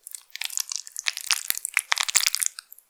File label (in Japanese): きつきつに無理やり, きつきつに無理やり入れている風の音; 挿入or引き抜く